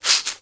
sponge or cloth wet soggy squeeze squelch; household

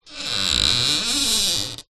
household door larder creak

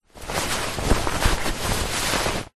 shirt handling; foley

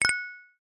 multimedia notification glassy chime pop up etc

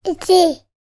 human baby toddler male 15 months vocal gibberish; sound effects one

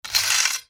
medieval sabre draw from sheath fast; still north media

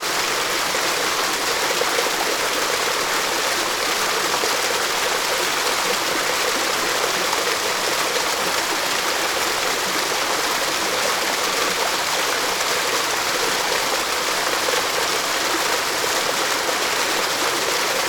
water waterfall into shallow pool forest; sound effects one

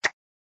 button tonal classic game synth click

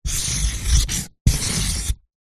office pen marker large draw on cardboard

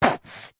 foley foam block dense stab sharp knife

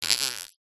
cartoon fart thin weak

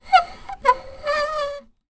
wood squeak turn; foley; zapsplat

